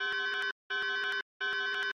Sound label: Terminal Alarm; Lethal Company; Windows